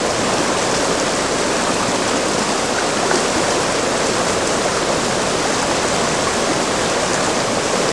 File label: River, Fast, Loop Mono; rivers